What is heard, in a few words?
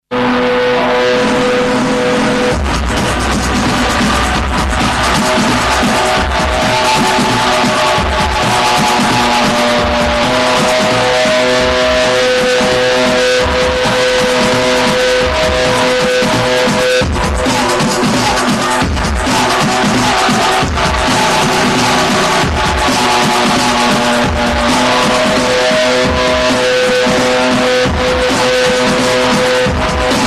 Live DUBSTEP NOISE; IRREVERENT FEST II 11 SEPT 2010